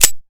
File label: slide forward, weapons; fiveseven